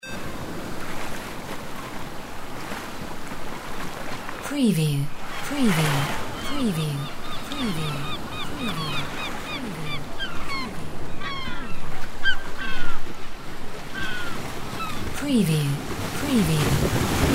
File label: AMB BIRDS SEAGULS WAVES